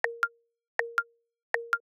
Navigation Left Turn Haptic; i OS; iOS10; nano

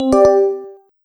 media insert; Linux

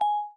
confirm; i OS; iOS10

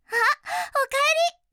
windows logon; Madobe Nanami

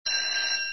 Ring In, Windows; Whistler

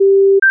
long low short high, iOS UISounds; iOS10

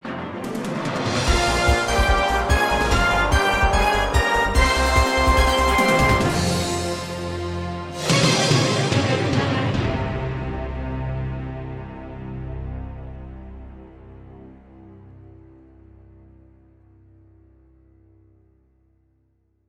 Host Entrance; USA 2020; Millionaire Soundtrack; US Revival (2020)

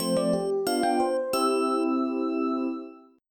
Shutdown; Linux

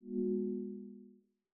navigation push, iOS UISounds; iOS10

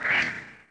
Reachability Disabled; i OS; iOS10

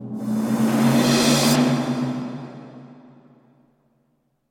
Fanfare; Millionaire Soundtrack

